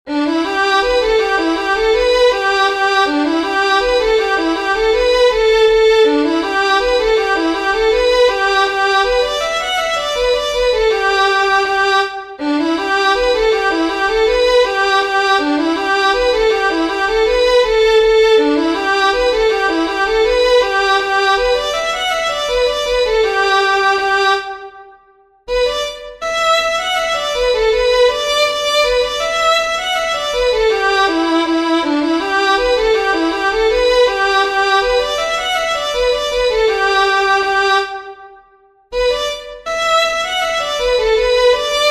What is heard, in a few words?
MANDOLIN; fiddlers; SINCLAIRS; Southern Kings Fiddlers; peifiddlers